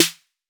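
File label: 808 snare drum; Wizoo - Platinum 24 Electronic; CHR SD 808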